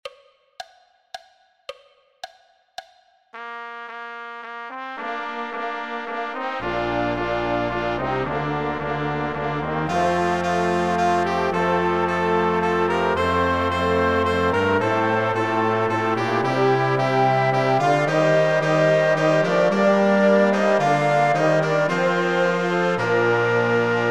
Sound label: Intrada Orchester; Pezel Intrada Tempo 110